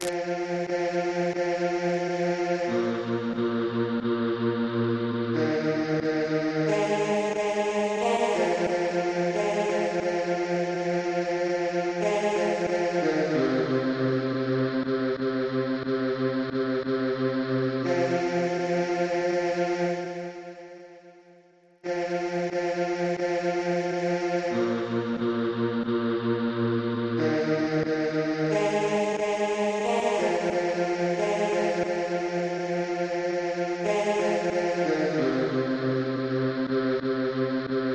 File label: bass; Go Down Moses